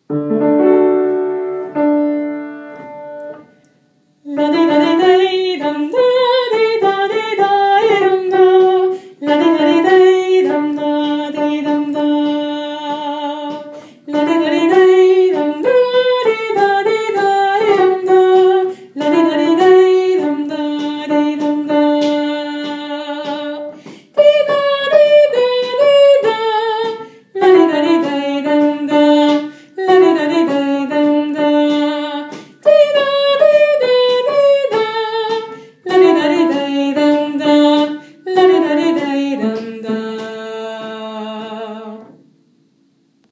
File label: Kom ALT